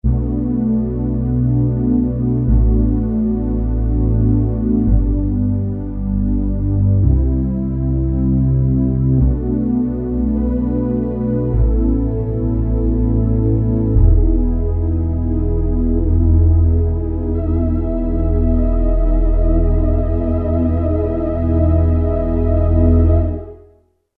IN THE AIR, Kurzweil K2000 ANALOG; Kurzweil K2000 Best of Analog

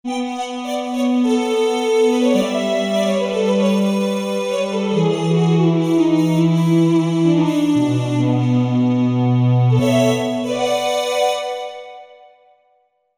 2 voices; Korg MSC-12S Synth 3

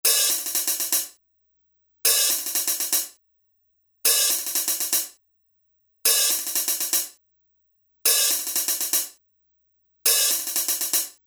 Riff

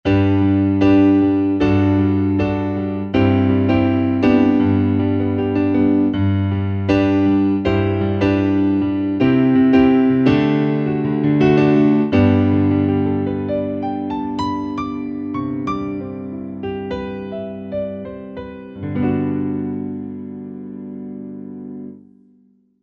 Soft-Stereo Piano, Korg TR-Rack

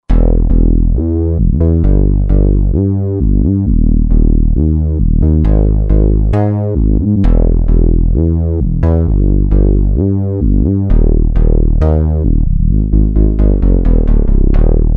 Roland JV-2080 Bs; Stereoww Bs

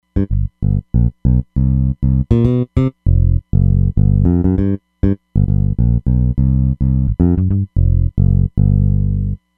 Nu Finger Bs, Roland Fantom XR

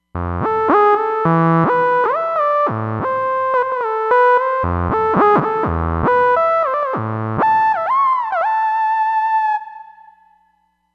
Jupiter Lead, Roland Fantom XR

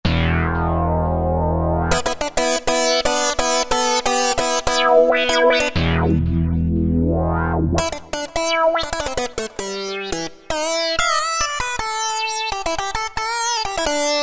Lead; Cheetah MS6

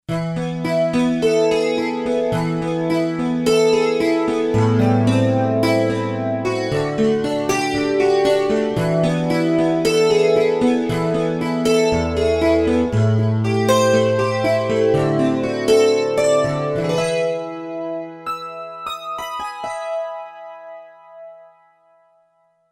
Guitar Rhodes, Roland SC-880; Factory Patches

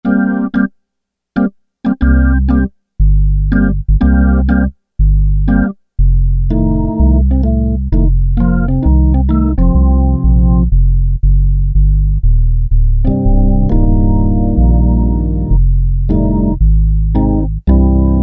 Kikn Pedals, Alesis QS8; Factory Preset examples

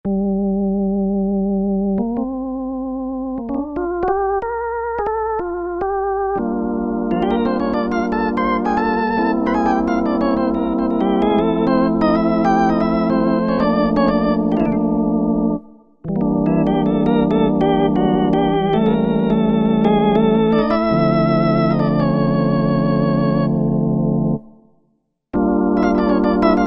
Korg MSC-9S Organ; Fifty-Splt

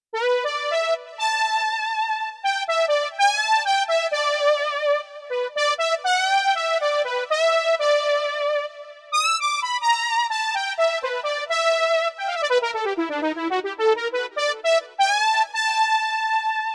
Soft Lead, Korg Radias-R

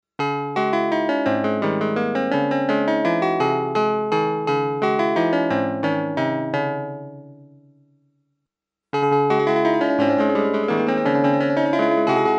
Delay LR

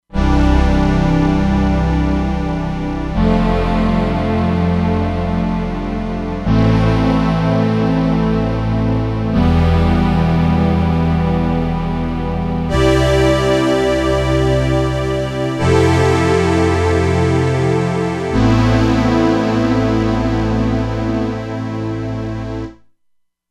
Deep Pad, E-mu Proteus-2; Factory Presets

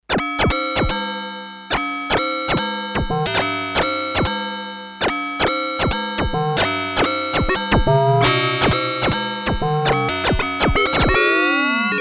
Inverted Clangorous, Sequential Circuits Six-Trak; Factory Programs

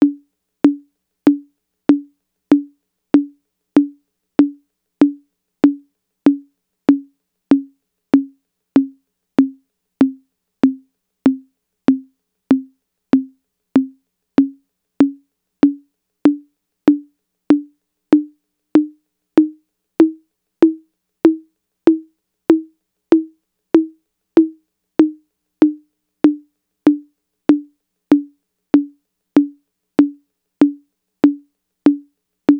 TR-808 Mid Conga; Roland; Instrument samples

